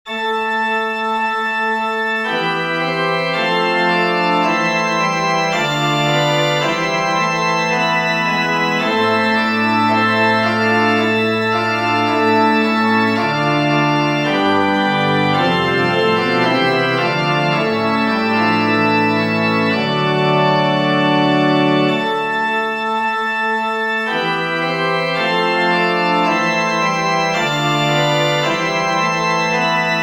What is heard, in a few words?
for pipe organ